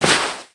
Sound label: Slide Dirt; Cookie Run; PDragon